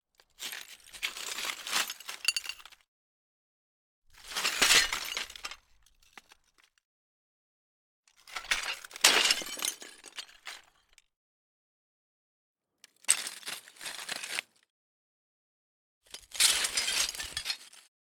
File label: Broken Tiles